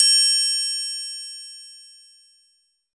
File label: Triangle Open, Percussion; Roland BK-5